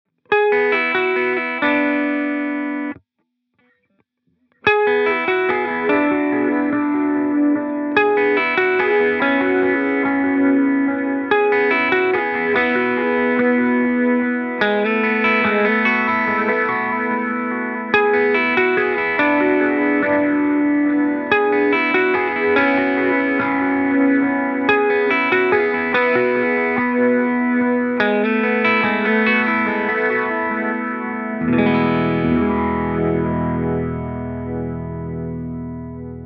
Analog BBD

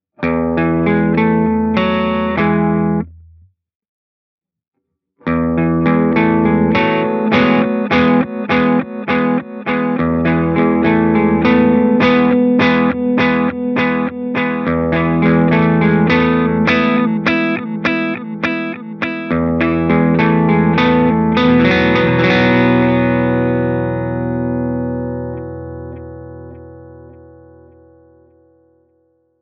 Digital Dynamic Feedback

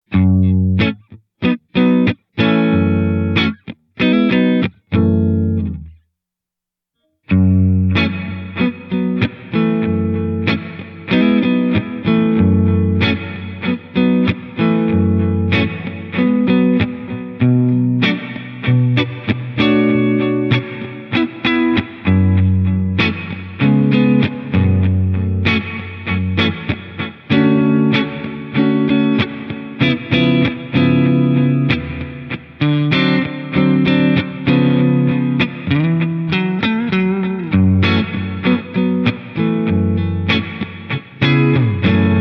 Delay Single Tap Tele

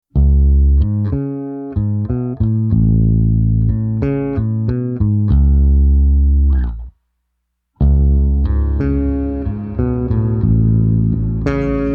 Plate Studio Bass Long, reverb

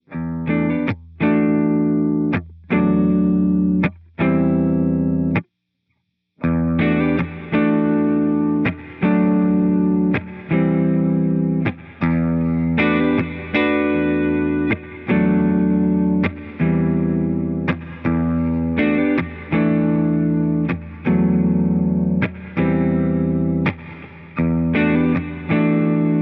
Hall Concert, reverb; CSI

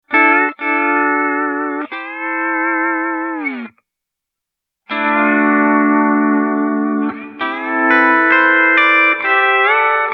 Hall Concert Pedal Steel

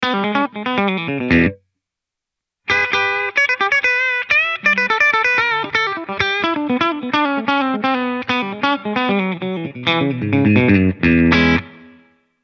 Hall Modern Short

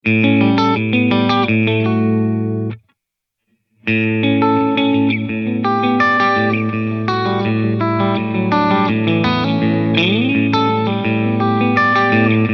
Plate Studio Long, reverb

